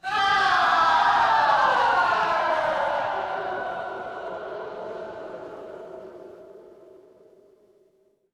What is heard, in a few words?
crowd cheer